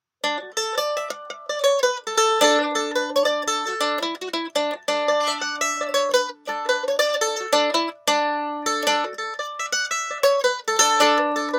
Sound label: Mandolin Pick Comparison